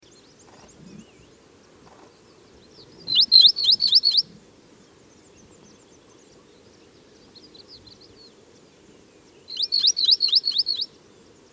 Rosy Pipit

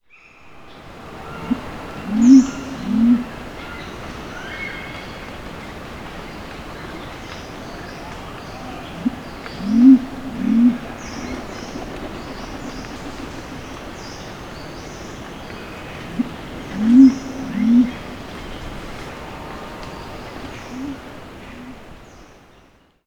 Mountain Imperial Pigeon Ducula badia

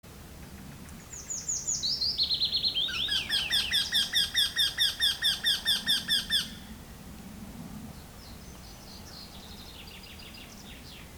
Eurasian Wryneck Jynx torquilla torquilla

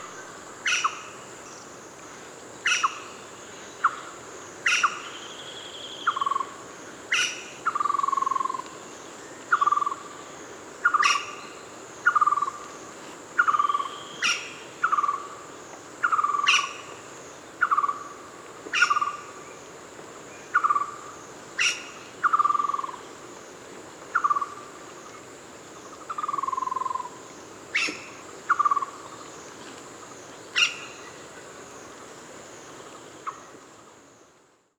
Greater Yellownape Chrysophlegma flavinucha

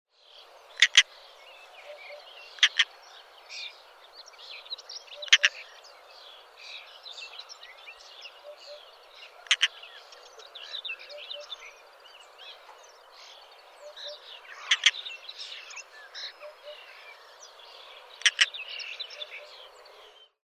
European Roller Coracias garrulus garrulus

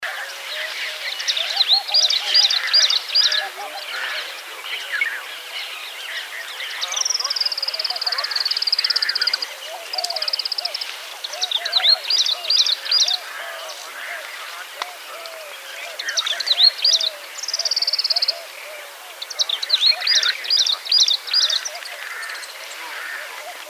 Rufous fronted Prinia Prinia buchanani

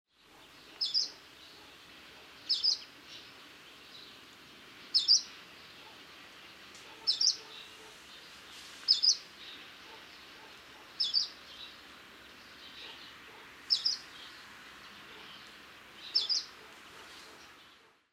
Humes Leaf Warbler Phylloscopus humei humei

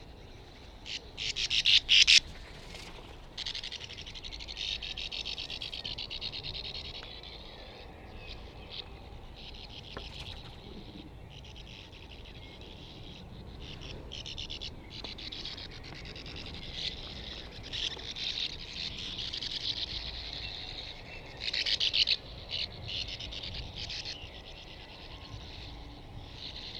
Sand Martin Riparia riparia, sand martin call

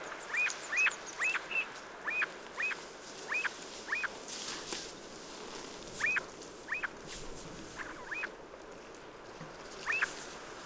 Snow Partridge Lerwa lerwa, snow partridge call

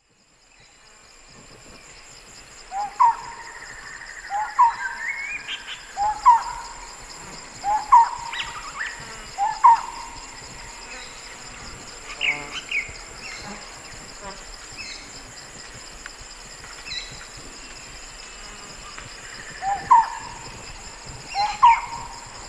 Lineated Barbet

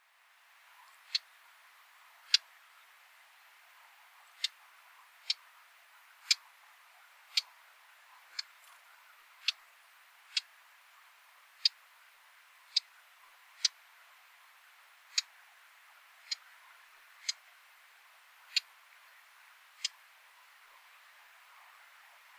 Humes Whitethroat Sylvia althaea

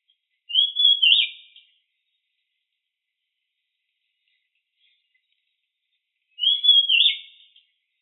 Rusty capped Fulvetta Song; call

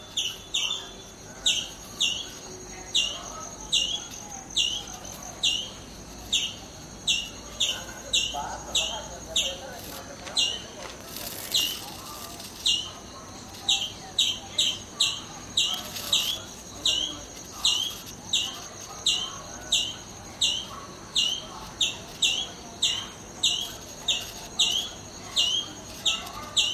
Common Tailorbird Orthotomus sutorius

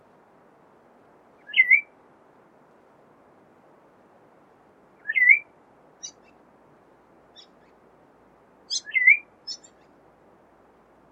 Red throated Thrush Turdus ruficollis

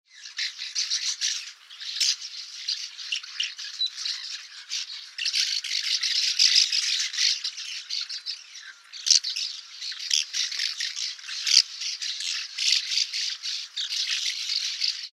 Streak throated Swallow Petrochelidon fluvicola

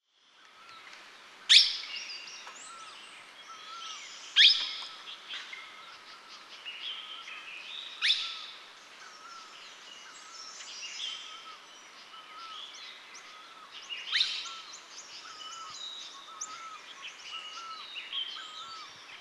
Plum headed Parakeet Psittacula cyanocephala

